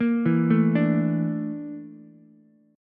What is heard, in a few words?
A sharp Major 7th Flat 5th A sharp Ukulele; A#M7b5; arpeggiated; JGuitar